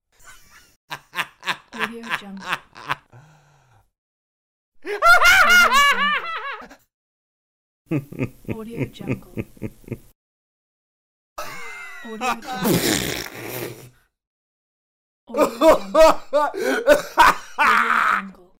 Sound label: laugh